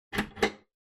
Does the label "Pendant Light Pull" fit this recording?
Yes